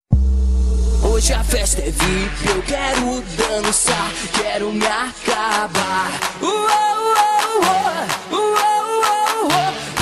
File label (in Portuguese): eletrofunk